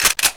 new m3 pump; garrysmod

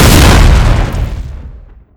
explos; garrysmod

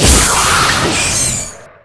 alien death; garrysmod

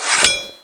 kata draw; garrysmod

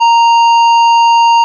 death machine alarm; garrysmod

